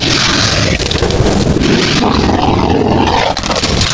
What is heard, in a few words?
Coyote, Wavetables; Cymatics; Serum; Animals for Serum - Gold Edition